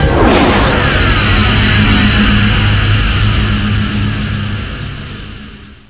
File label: fire stream; xshipwars